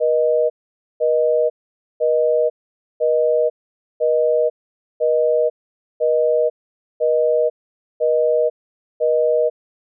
Busy Tone

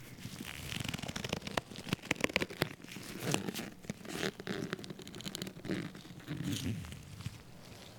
Latex Glove Stretching

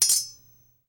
Sword clash

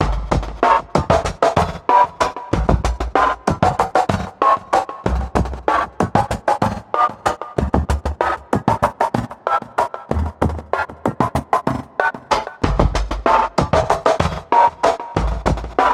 95bpm Drumloop

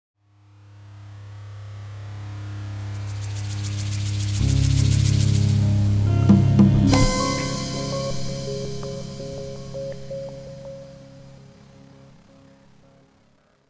login sound

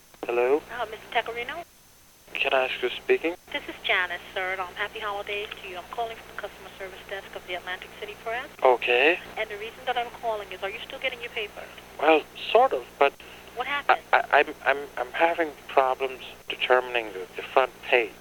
Call

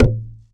Bongo Split

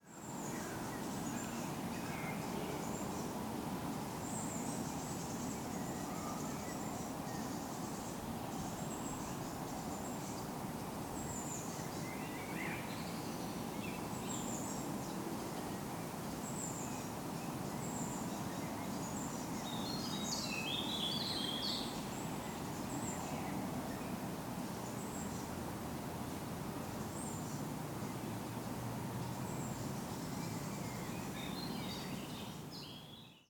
Forrest Birds; Skodsborg

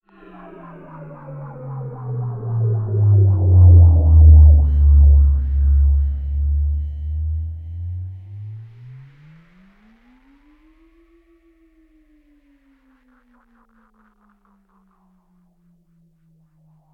Spaceship Moving

